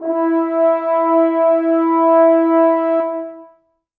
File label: brass acoustic, muted trumpet